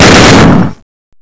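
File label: machine gun; svencoop; classic; zombieman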